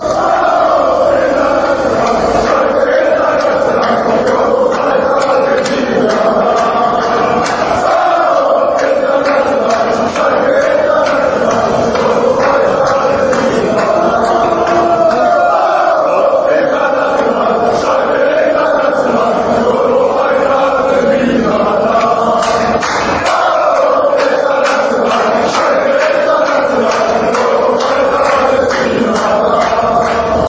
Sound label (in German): Die Eurofighter sind wieder da, fanchants